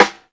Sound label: dry snare drum sample b key, snare sound tuned to the B note